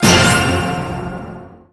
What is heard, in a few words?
FEVER START; Puyo Pop Fever